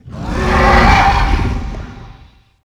dragon voice

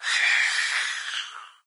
hiss, maggot; doom3

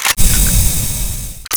arc vent

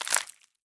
eat

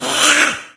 attack, cherub; doom3

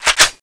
reload, weapons; tfa cso

